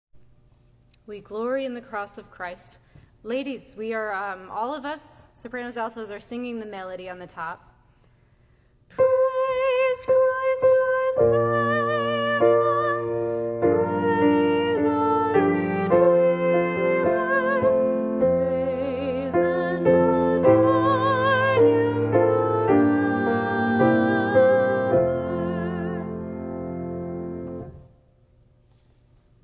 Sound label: women; We Glory in the Cross of Christ